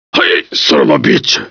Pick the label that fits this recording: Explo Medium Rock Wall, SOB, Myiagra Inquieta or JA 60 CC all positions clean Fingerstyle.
SOB